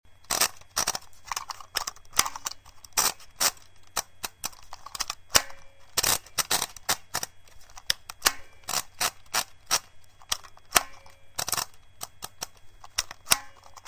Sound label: handheld braille labeler